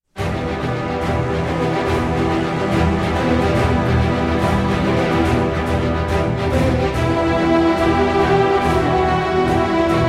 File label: Orchestral